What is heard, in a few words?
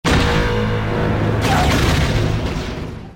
seismic; slave1